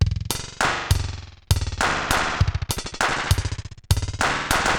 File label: Digital Delay RX17 (100BPM), cyborg beats